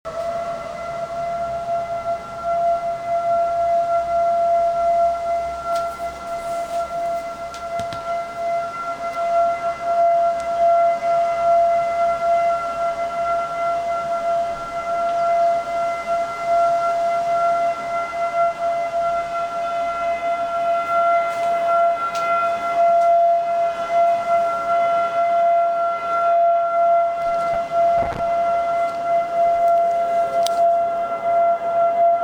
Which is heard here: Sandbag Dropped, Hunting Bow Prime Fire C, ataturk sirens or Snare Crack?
ataturk sirens